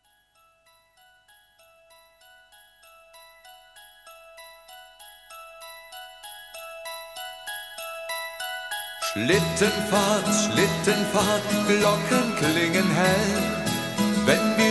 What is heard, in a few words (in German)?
schlittenfahrt jingle bells